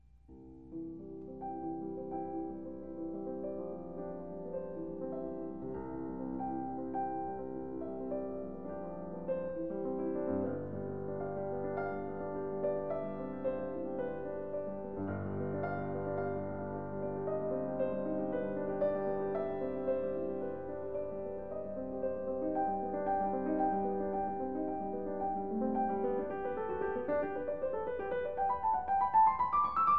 Piano Sonata In C Major, Rondo; Allegretto Moderato; Caio Pagano; Beethoven